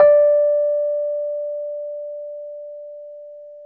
RHODES EP2 D4; Sonic Reality - Vintage Timetraveler

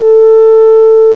MELOHORN, WIND; CMI Sound Library Rev 1.3

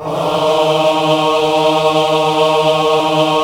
AAH, VOCAL

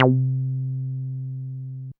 Dance Floor Bass, Multisample Basses

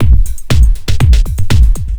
4 floor beat, trance

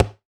Washing Up Bowl; Hits

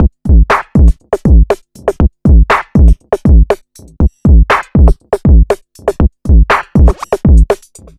hop hip 120bpm, Downbeat Dance Grooves

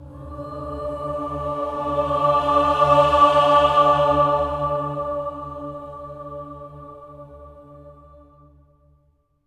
OCT A3, SWELL OCTAVE, Voices

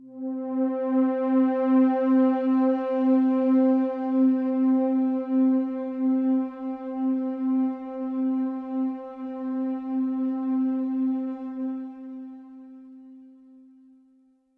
Pad C, Synth Prophet One Shots; Electronisounds

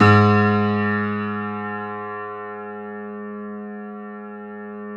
G#1 YAMAHA R, GRAND; NS GRAND

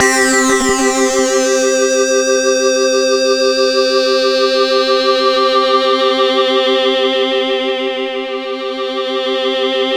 C4, SynthLine; 90 s Sample CDs; NiceBeats; SynthLine VOL-9

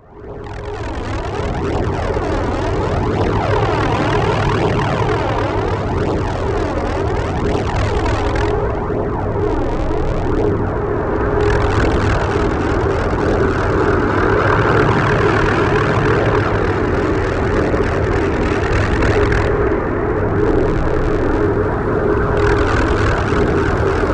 Alien Building Site; Zero-G - Ghost In The Machine

